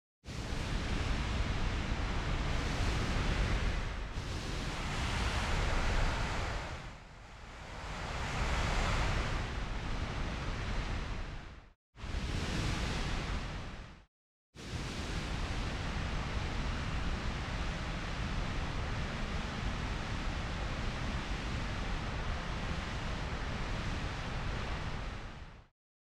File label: granular synthesis, nature ambient; aulart